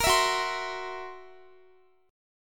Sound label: Fsharp 7th Flat 5th down Guitar; F#7b5 strummed